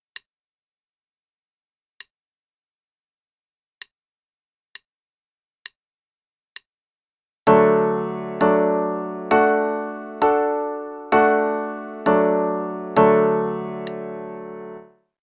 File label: Chords for D Major (qn=66); CM Level 2